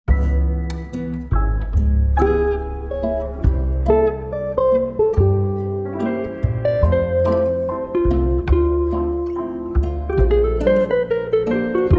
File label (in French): Musique Jazz Bossa